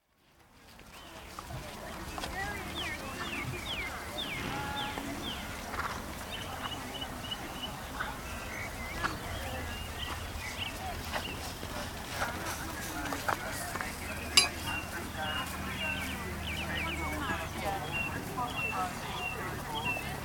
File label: Show Jumping, collecting ring (no jump), horses warming up, with voices, faint birdsong & very distant PA; Horse Sound Effects; BBC